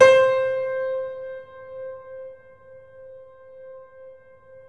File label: The Piano, sustain; YAMA SUSTAIN; InVision Interactive